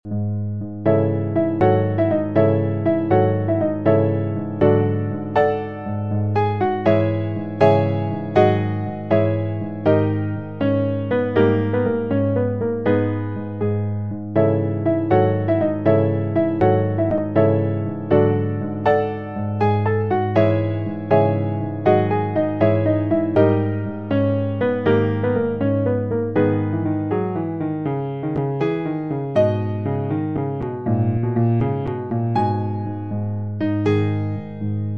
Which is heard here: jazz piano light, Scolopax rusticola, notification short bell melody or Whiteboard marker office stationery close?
jazz piano light